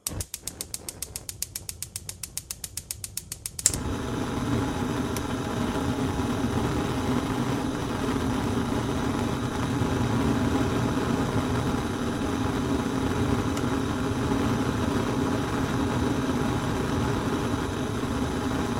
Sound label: gas stove